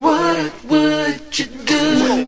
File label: what would you do, male SUNG; questions